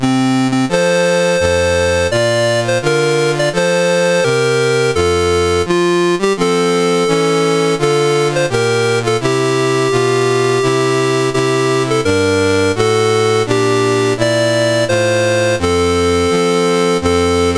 Congo, National Anthems, CSG8580R5

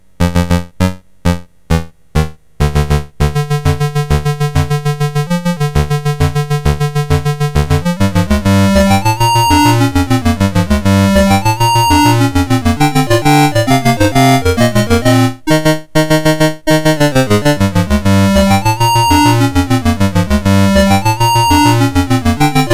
UFO, MOS6581R3

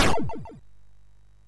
sid MOS6581R3

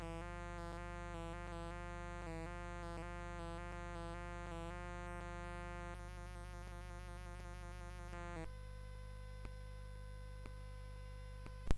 Gavotte, CSG8580R5

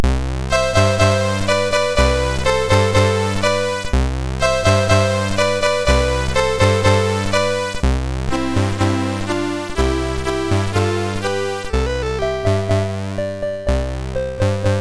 MOS6581; MOS6581R2

